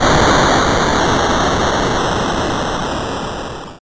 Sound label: Fire 8 Bit